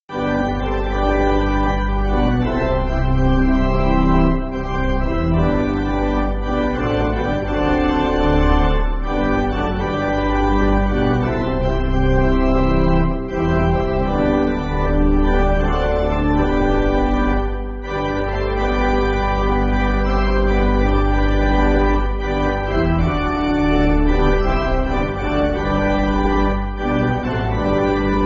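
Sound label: Pipe Organ, church backing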